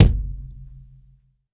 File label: Kick, drum